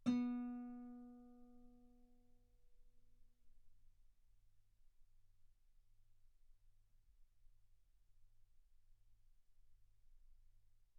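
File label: Guitar ff B string B3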